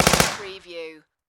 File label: Submachine Gun HK MP5